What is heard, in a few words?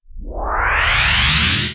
Uplifter